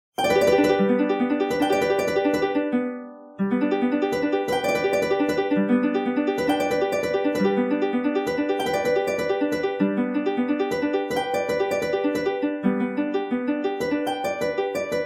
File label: With Harp; Skyward Sword Original Soundtrack